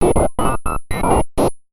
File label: Radio Relay; martyn stonehouse